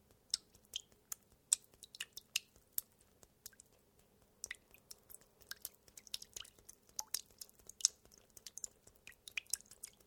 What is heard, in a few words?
Water Drips Sequence, recorded in a domestic pool; Nature